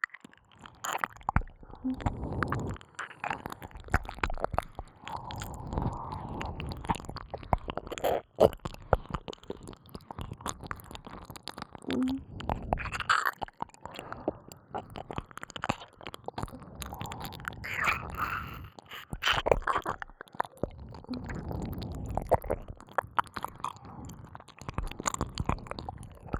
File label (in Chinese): 黏糊糊雙重舔耳; 附錄迴圈式音軌（自慰輔助）; 耳邊迴圈